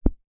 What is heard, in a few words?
book large heavy hard back close; household